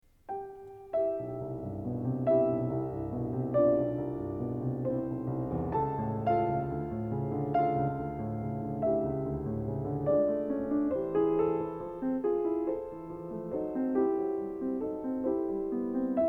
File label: 33 Piano Variations in C; Anton Diabelli